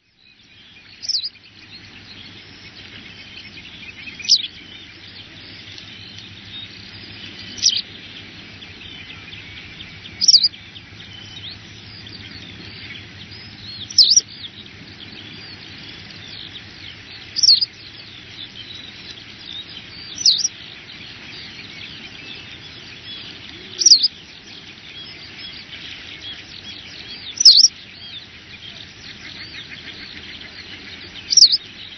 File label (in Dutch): kwikstaart